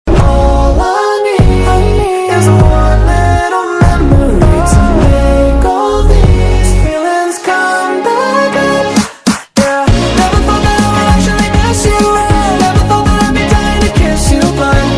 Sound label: ringtone